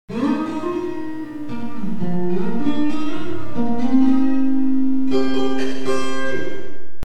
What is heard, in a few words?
Reverb